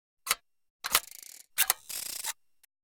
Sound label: Circus Machine L / R Ch. Cartoony, Robotic.